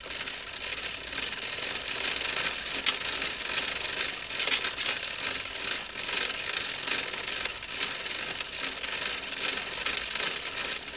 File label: Bike Pedal Med Low Gea